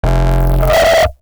Uptempo Tail, Experimental; SFX; Experimental - Other

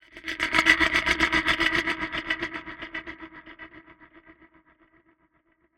Sweeper; SFX; Ambient - General

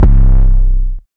808, Bass

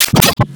Audio Corruption, Electronic

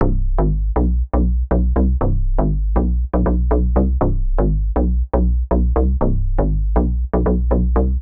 Sexy Bassline; Melodies

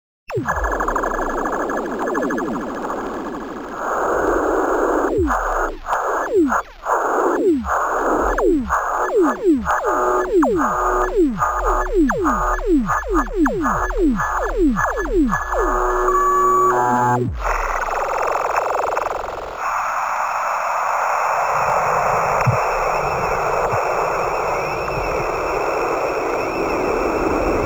Glitch Drone; SFX; Experimental - Other

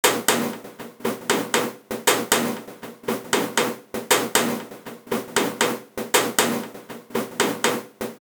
Experimental Textures High 118 BPM

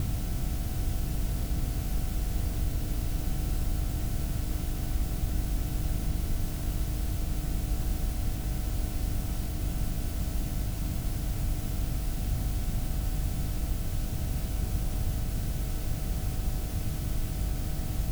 Betacam Noise; SFX; IRL Recorded

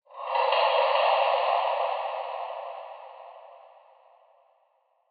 Breath

